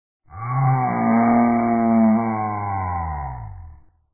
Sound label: yay